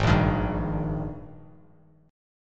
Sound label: Ab Major 7th Suspended 2nd Ab down Bass Standard; strummed